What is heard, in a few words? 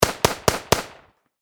Warfare Machine Gun Ak 47 Four Shots Close Perspective; Machine Guns